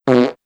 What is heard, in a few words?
fart